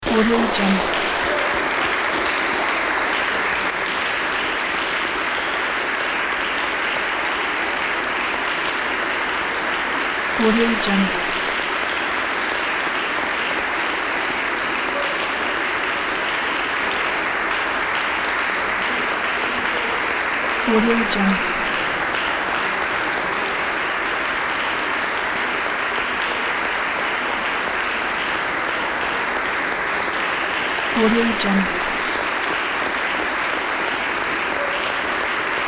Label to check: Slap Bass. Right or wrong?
Wrong